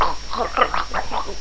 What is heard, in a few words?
gurp; quake2; chastitymarks